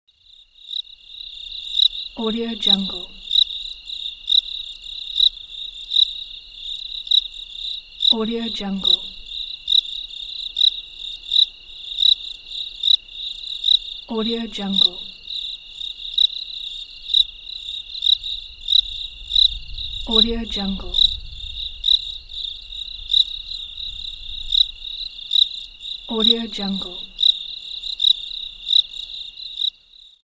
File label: crickets calling